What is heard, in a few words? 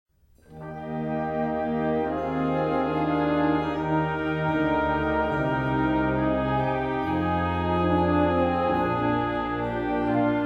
concert e flat chorale